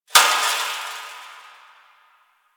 Fence Rattle One Off; Warframe; Ambience; Nineteen Ninety Nine; DE

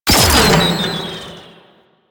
Proto Infested Mutalist Proj Fire; Warframe; Abilities

